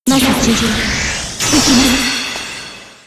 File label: distortion